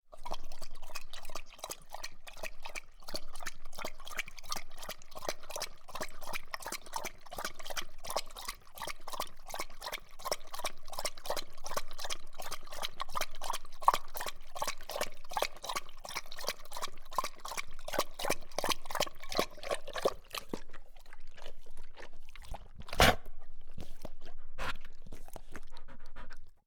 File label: dog drinking close up